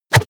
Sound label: whoosh air hard fast